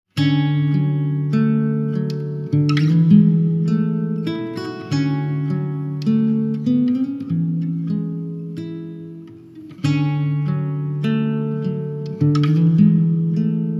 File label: Lullaby